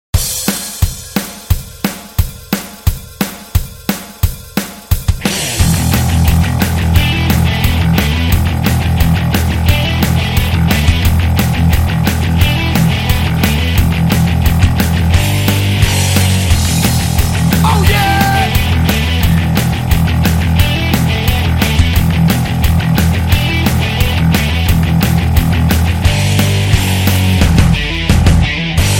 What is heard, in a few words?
metal